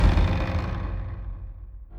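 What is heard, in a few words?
Music Volcano Light 120; From Dust; PS3; Xbox 360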